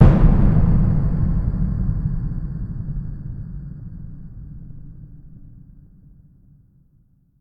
hit lo scary; brand x music

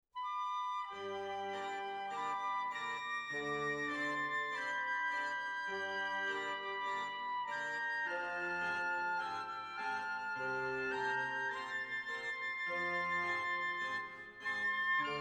the barrel organ; alfred shnitke

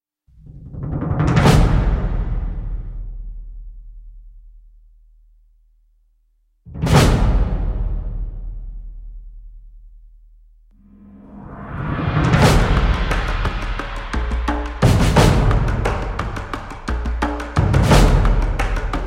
prc only

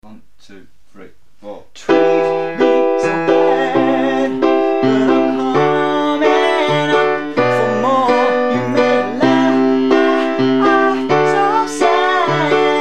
piano

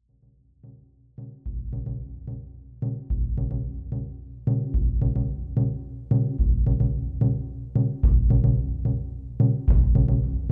instrumental theme

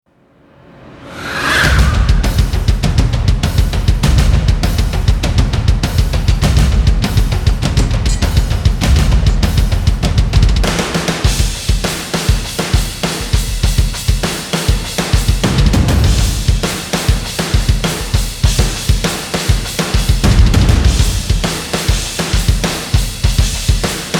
drums

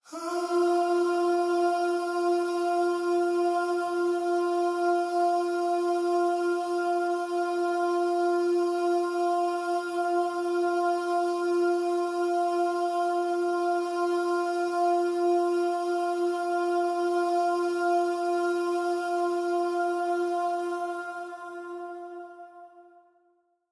pop vocal no scoop F

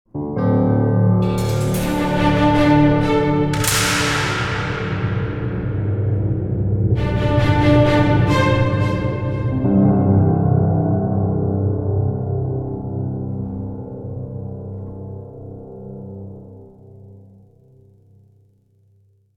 magical trailers transition; sound adventures

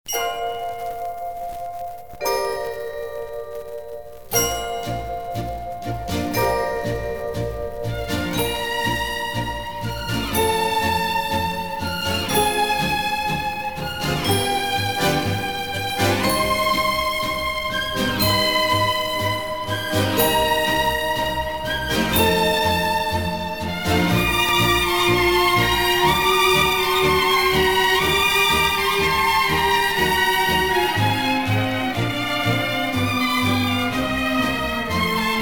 strings orchestra

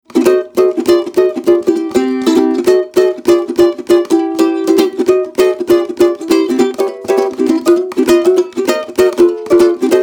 ukulele swing

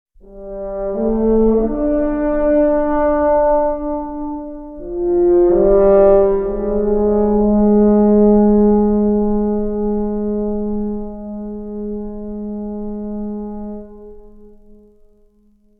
magical trailers transition; sound adventures

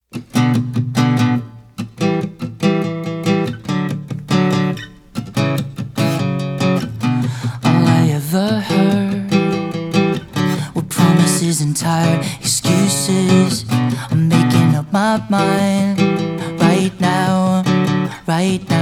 live and acoustic; from nyc

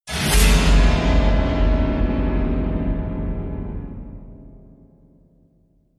piano scrub

